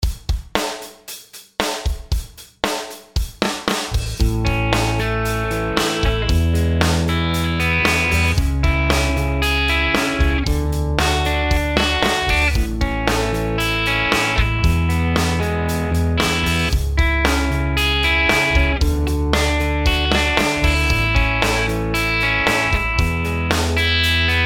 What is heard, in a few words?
chord progs maj root; harmony